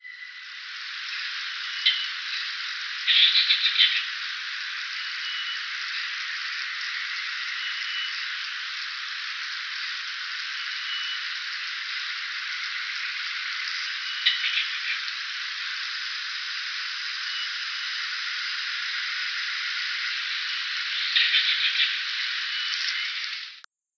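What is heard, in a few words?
limnonectes paramacrodon, Masked Swamp Frog call; Central Catchment, Singapore, 06 Jan 2022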